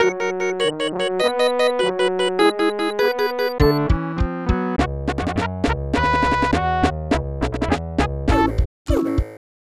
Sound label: Unused Jingle